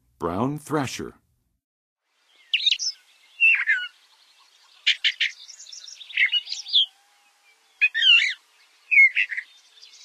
Brown Thrasher, Songs of Birds; North America; Western USA; Western USA Songbirds

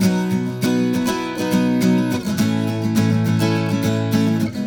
Guitar, Acoustic

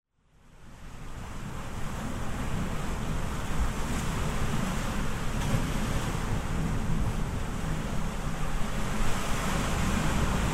Ocean Waves; Nature Sounds; Sounds of Nature Collection

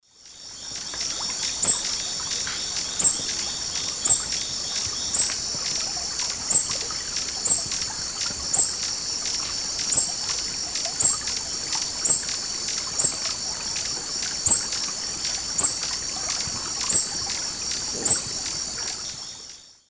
Thalurania furcata, feeding calls 2 birds; S. America; Eastern Lowlands

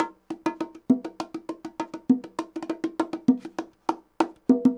bongos 100, latin, 100bpm; Sound Effect Library

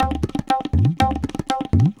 tabla 120

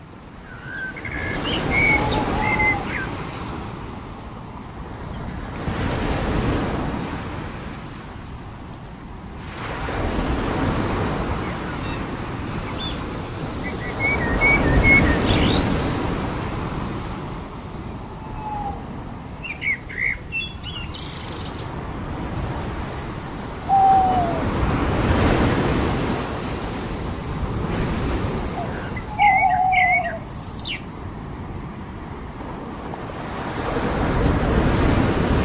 Birds With Ocean Waves on the Beach

